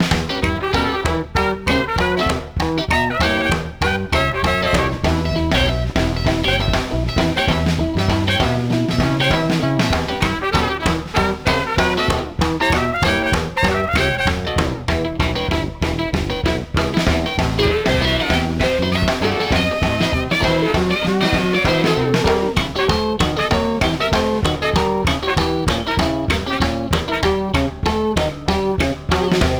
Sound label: clock puzzle tune; Voodoo Vince; XBOX